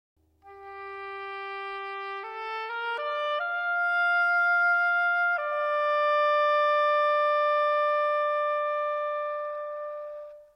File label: the stream oboe solo